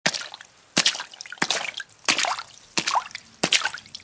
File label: walking in water